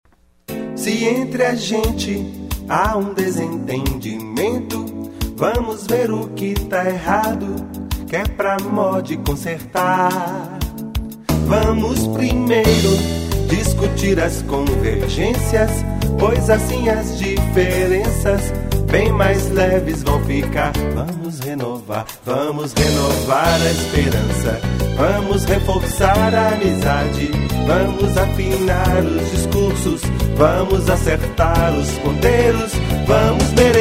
samba; partidario pmdb

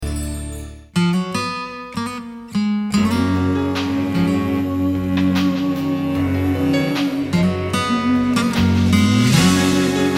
cantora vinheta

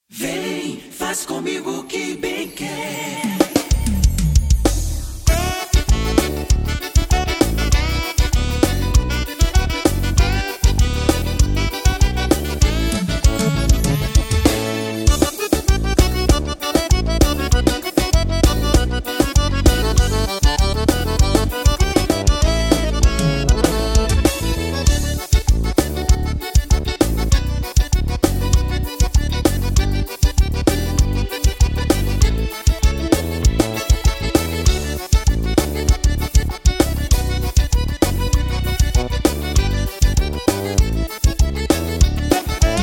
playback forró das antigas

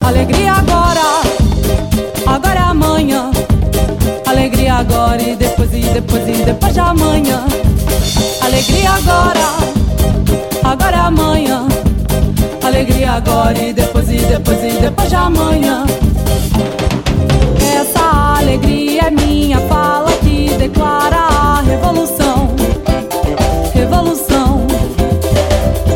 música de rua

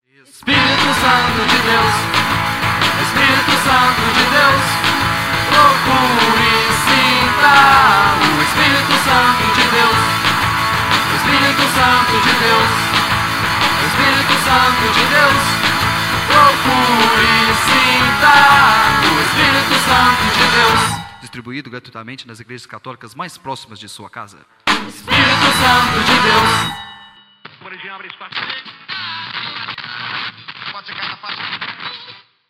intervalo comercial

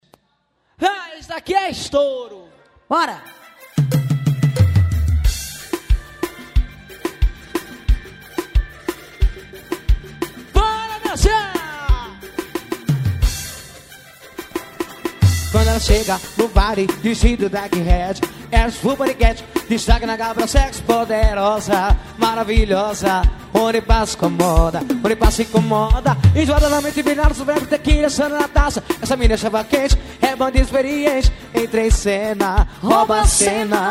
forro poderosa ao vivo

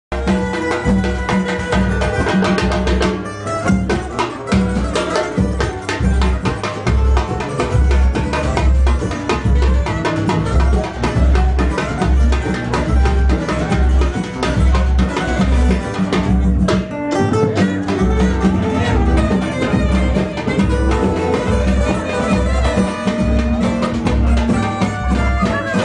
samba

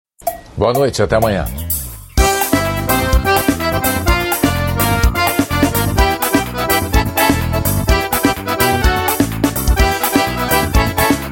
abertura do em forro

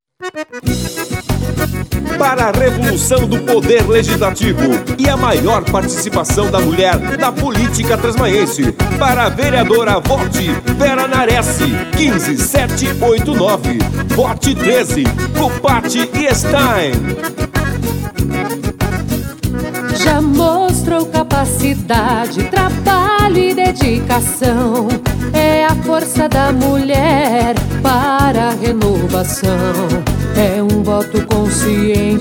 jingles; vera naressi vereadora

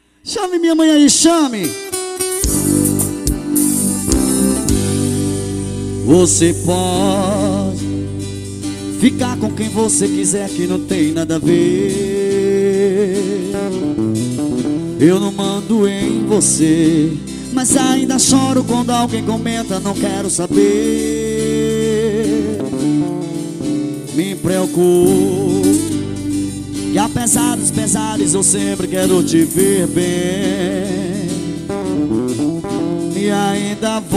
ao vivo medios graves

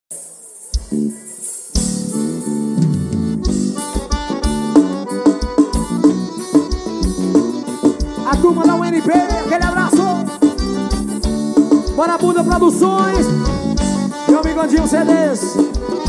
forró

